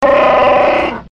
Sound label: roar